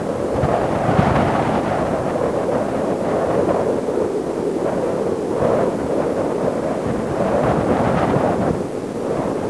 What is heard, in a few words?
wind